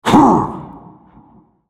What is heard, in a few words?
effort; Hecarim